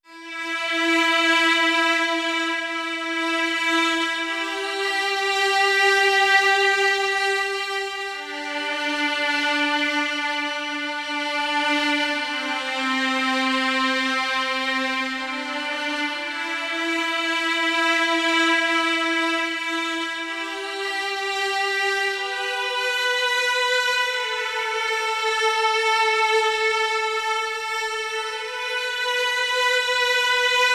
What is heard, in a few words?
Violins - Am 60Bpm; Construction Kits; STEMS; Ghosthack; SkyRealm; Kit 03 - Winterhold